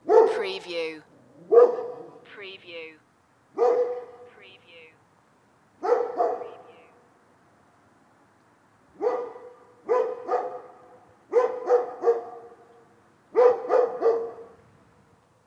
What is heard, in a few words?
distant dog barking loop